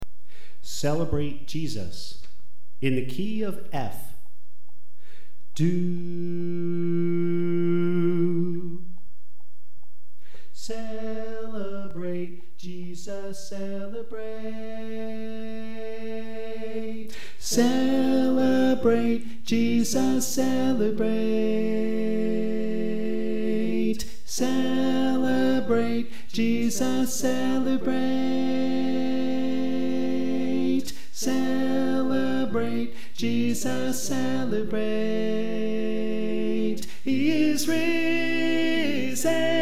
Tenor

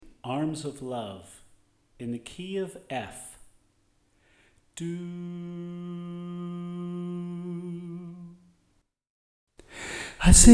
Soprano

